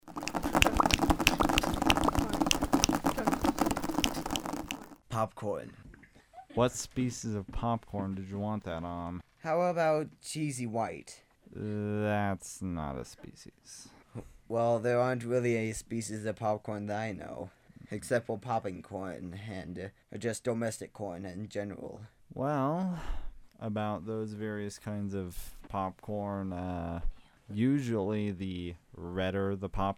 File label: popcorn sounds